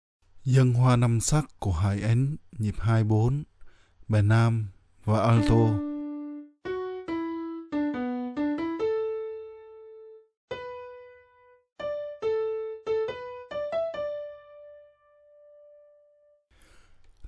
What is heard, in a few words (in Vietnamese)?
Dang Hoa Nam Sac ha Nam Alto